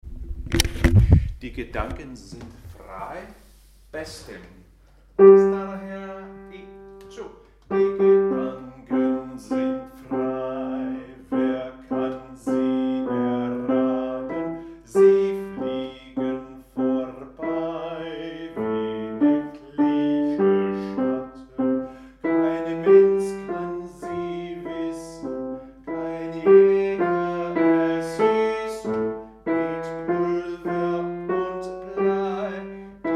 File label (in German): bas; Die Gedanken sind frei; harlev koret